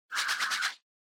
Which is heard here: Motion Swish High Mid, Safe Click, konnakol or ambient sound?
Motion Swish High Mid